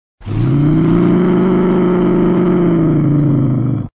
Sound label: Bobcat Growls